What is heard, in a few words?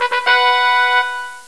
success; picovga; vga train